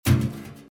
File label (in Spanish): golpea tacho